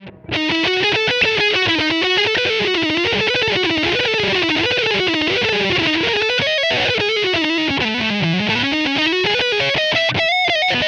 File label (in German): Schredding